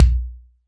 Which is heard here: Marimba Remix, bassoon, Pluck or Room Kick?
Room Kick